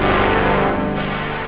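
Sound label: guitar, synth chords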